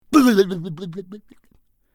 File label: Pig hit; bad piggies; android; mobile; windows